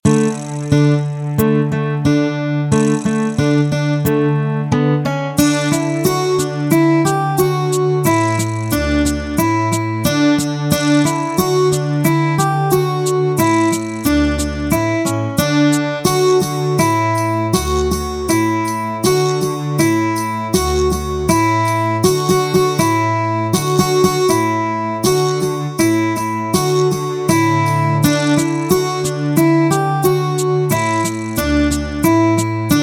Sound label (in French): comptine pour se laver les mains instrumental